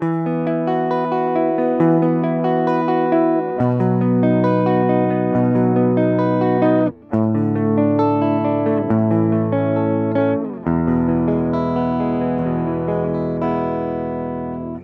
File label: EMG81X (neck) - Clean